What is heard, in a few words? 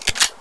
m4a1 mag in